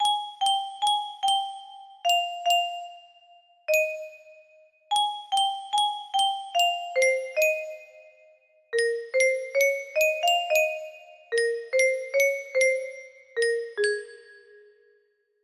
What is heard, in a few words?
music box